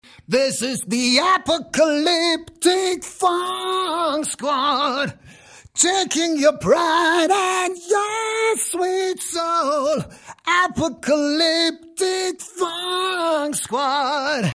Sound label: Phaser; Radial Engineering Vocoloco